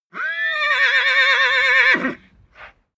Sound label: horse neigh hard; natur-tiere; pferde